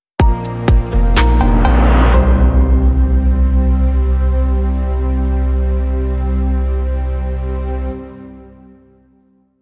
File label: Sting No Synth